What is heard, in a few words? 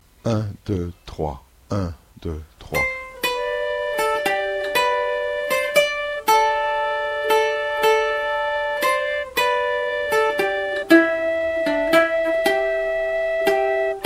flute